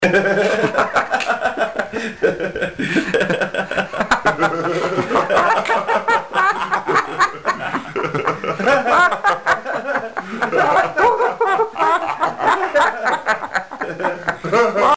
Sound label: laughing